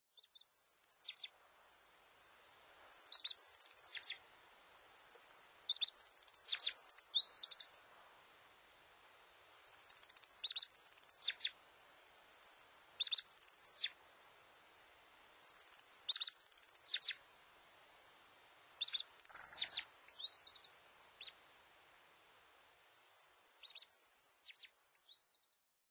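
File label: Japanese Accentor; kayakuguri; norikura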